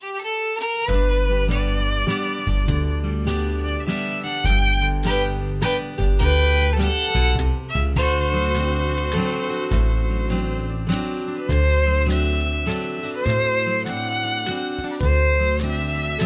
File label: Petticoat Waltz